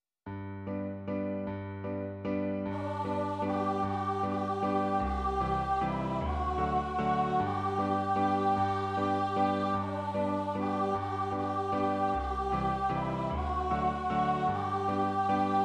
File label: duet